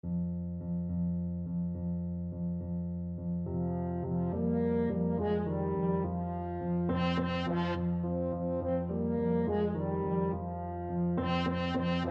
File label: Lhomme arme, french horn